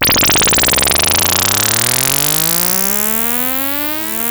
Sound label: Moog G3, rare synthesizer; Hollywood Edge; Giorgio Moroder Rare Synthesizer Collection; MLTI.MOOG